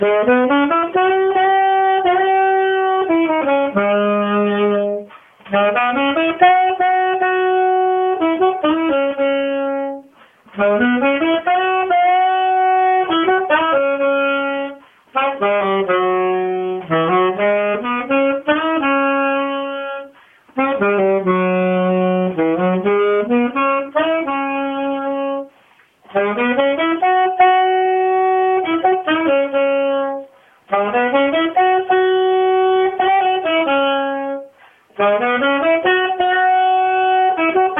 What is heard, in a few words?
blues